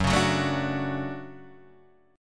D-Major 7th Gb

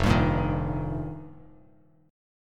F 9th Suspended 4th, Bass Standard; strummed; JGuitar

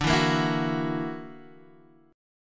F sharp Augmented 7th D, chord

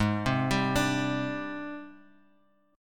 G sharp Major Sharp 11th; 1,0,3,5; 4G,5C,5E,5A

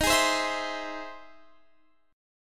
E Augmented Major 7th

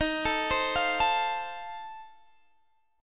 F 7th D sharp

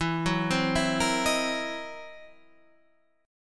C 7th Sharp 9th Flat 5th E

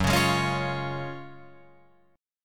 Gb Major 7th Suspended 2nd, Guitar Open F; GbM7sus2 strummed; JGuitar

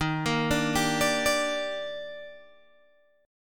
Eb Major 7th, Guitar, Open G

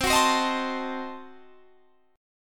C Diminished 7th C down Guitar Standard; strummed; capo=2

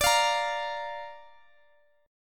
D Minor Sharp 5th D down Guitar Standard; Dm#5 strummed; JGuitar

